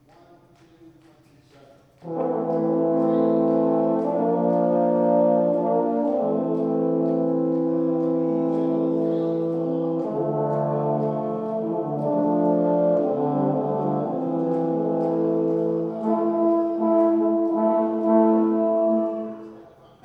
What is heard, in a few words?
Low Brass; north star brass woburn ma